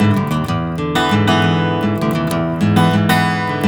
BARI RIFF, Blues Guitar; ILIO; Fretworks

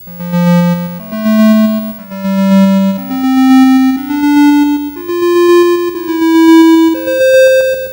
Atari 2600 Synth FX

